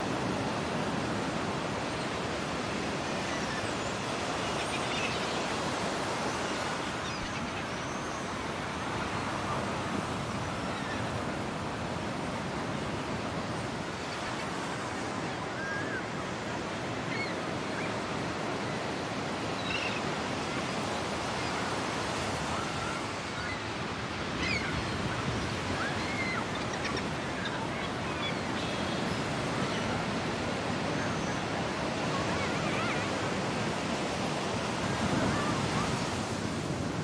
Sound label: ambient beach; Miami Beach; Wazdan